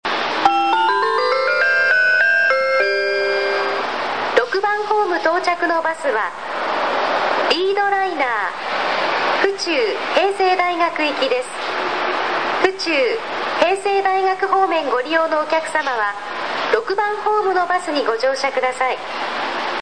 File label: bus center; ６番ホーム案内放送; 広島バスセンター; 広島そごう３階にあるバスターミナルで、高速バスだけではなく一般路線バスも発着します。; 出発ホームでは主に高速バス到着時に自動放送による案内放送が流れ、冒頭にはのりば毎に異なるメロディーがあります。